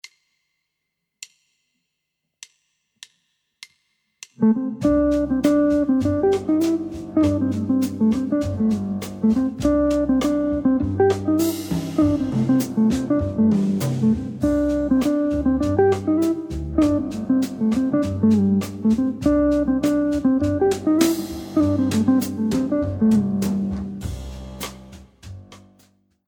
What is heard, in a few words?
lick